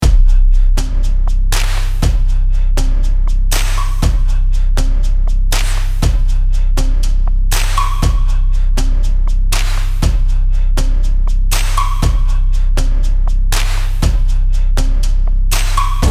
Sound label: cinematic; cloak dagger